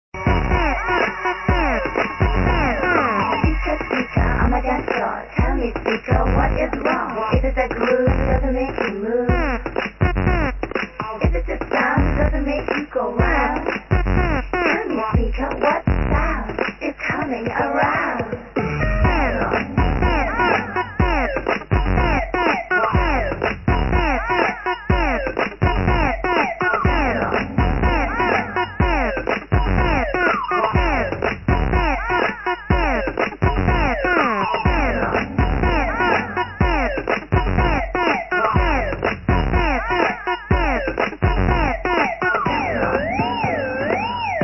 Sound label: stylo dance